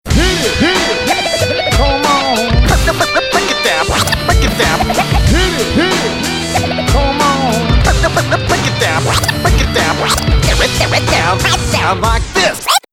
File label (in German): Klingelton HipHop